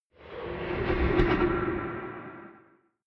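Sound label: underworld inhale, drones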